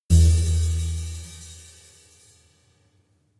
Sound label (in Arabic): إنتقالة ختامية